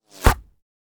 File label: bullet hits body